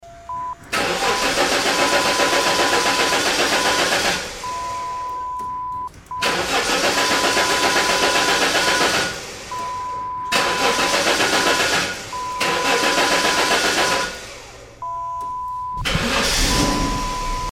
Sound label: Car wont does start